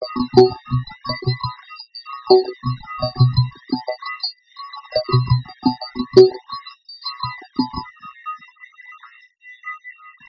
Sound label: plinging synth rythm abstract